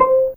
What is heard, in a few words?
Viol Piz C4, PizzStr; Fairlight CMI IIe